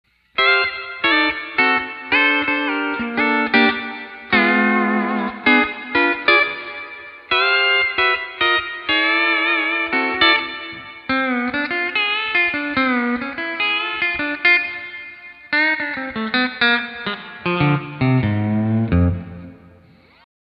Stock C6 Lick; D13 Copedant Tabs

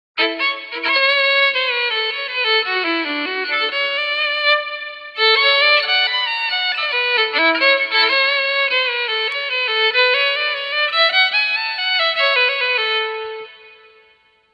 Fiddle; Quilter Steelaire Pro

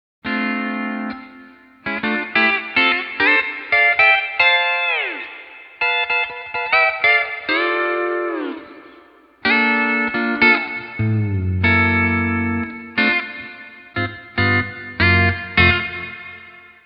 D13 Pedal 7 Workout; D13 Copedant Tabs

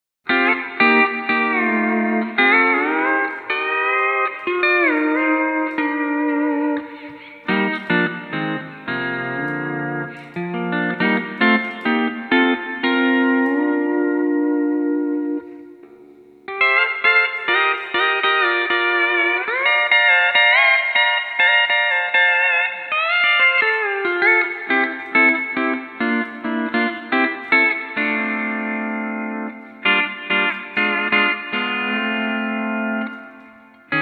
Quilter Labs Travis Toy 15 200-watt Combo Amp; TT15 FRF

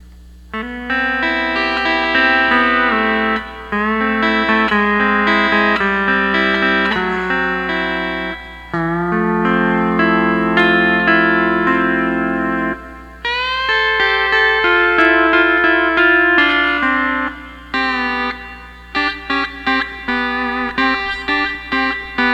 12 String Lap

